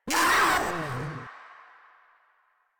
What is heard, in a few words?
Pain; Warframe; Proto Infested Runner